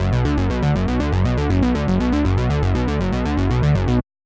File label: arpeggiator gate 100